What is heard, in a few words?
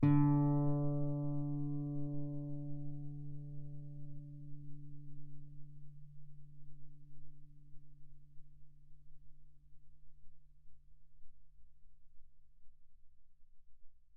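Guitar fortissimo sul D D3 B3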